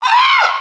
widow pain; rogue

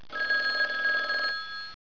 phone

